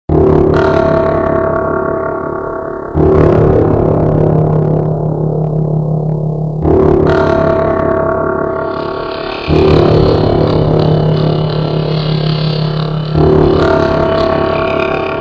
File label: Static Mix